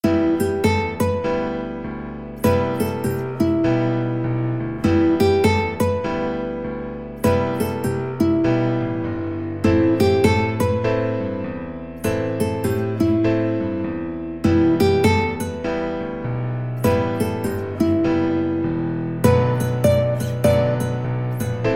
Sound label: ukulele